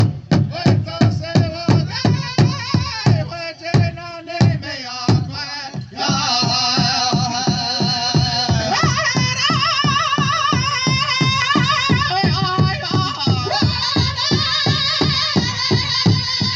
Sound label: Native drumz